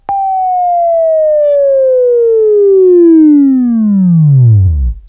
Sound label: Buzz; Labview-Program; Sweeping Training program